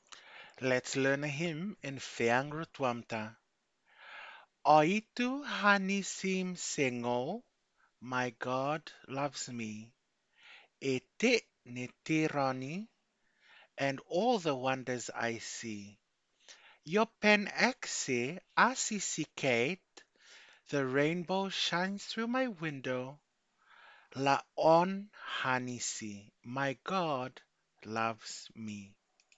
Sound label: hymn in Rotuman; Learn-a-hymn-in-Rotuman; 2025 pacific language weeks